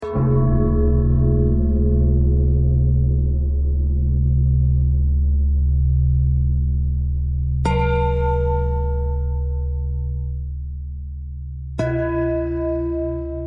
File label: bell thread